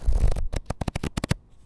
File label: Flipping Pages; BB Retail Therapy Sample Pack